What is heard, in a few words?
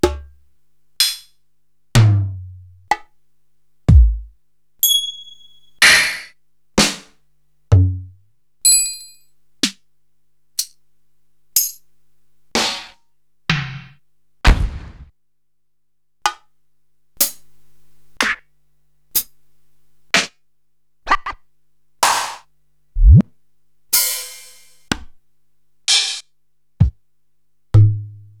Korg TR-Rack, Samples Sliced; m8